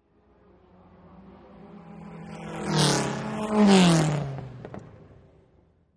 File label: Vintage Car Race Bys